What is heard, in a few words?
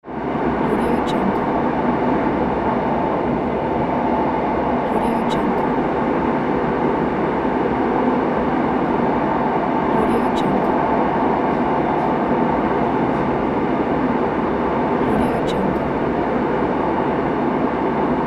subway train inside